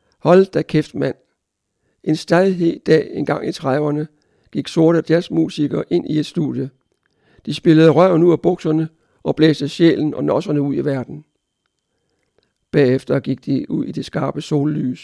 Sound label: jazz